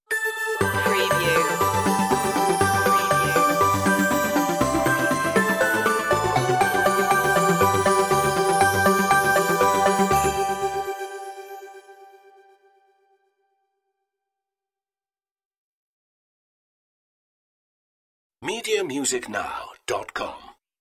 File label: merry christmas synthified stinger outro